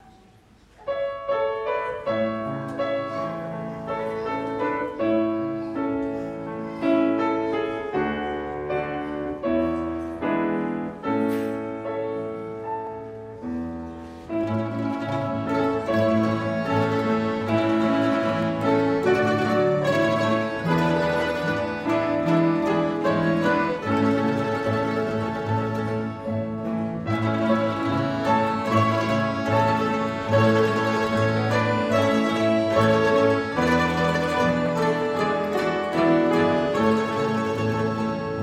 Orchester; 2.Orchester

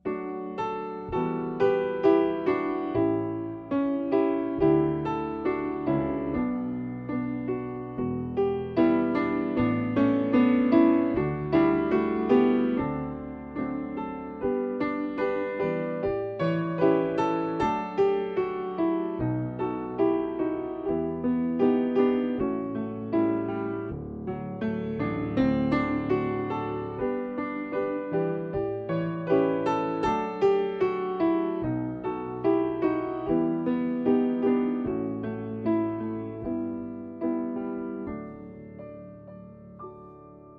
Vorspiel

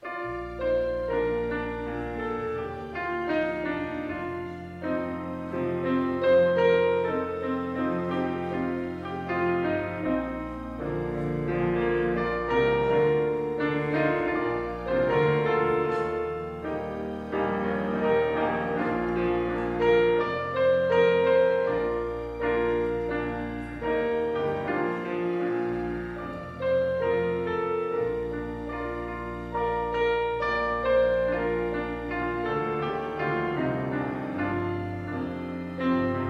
Vorspiel

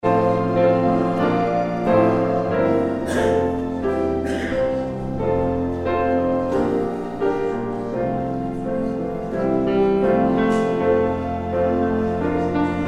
Vorspiel

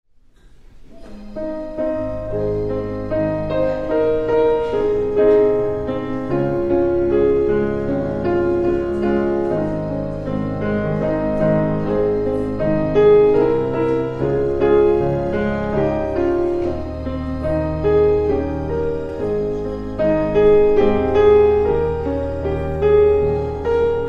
Vorspiel

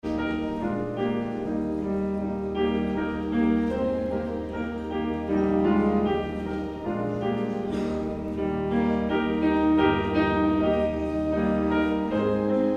Vorspiel

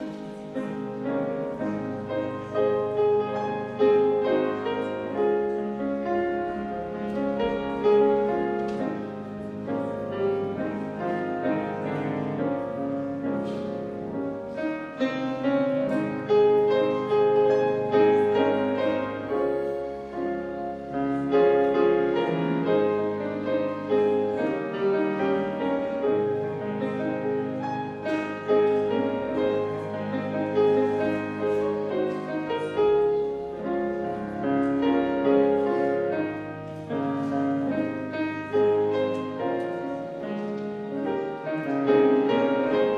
Vorspiel

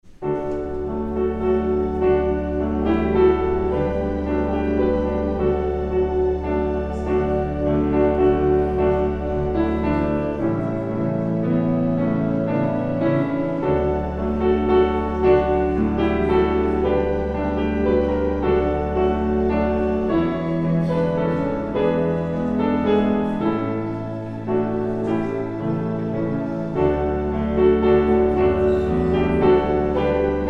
Vorspiel

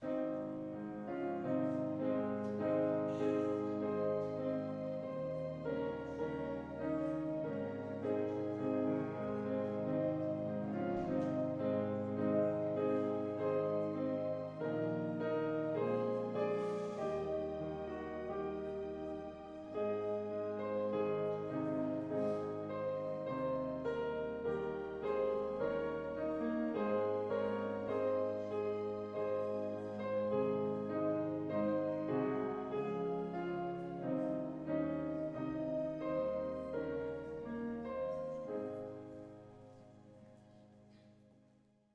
Vorspiel

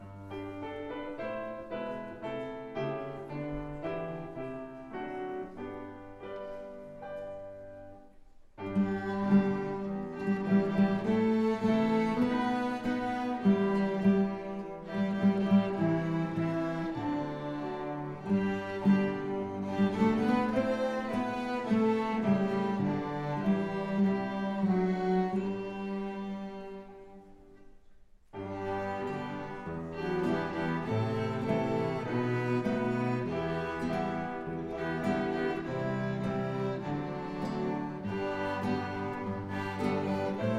Kinderorchester

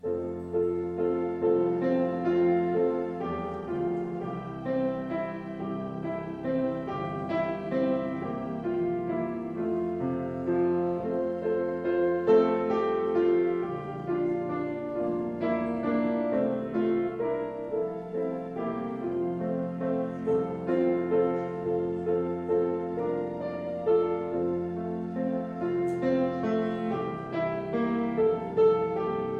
Vorspiel

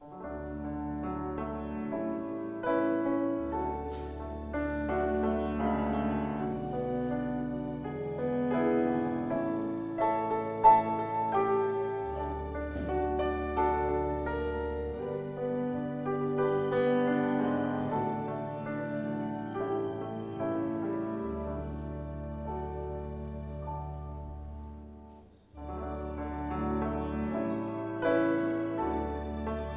Vorspiel

